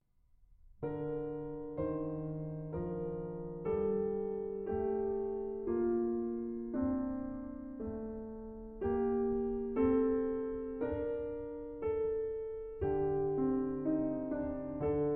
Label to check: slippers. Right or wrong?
Wrong